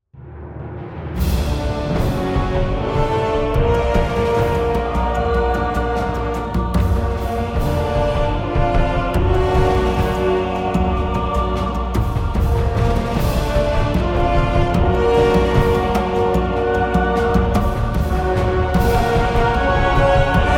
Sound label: boss combat; brackenhide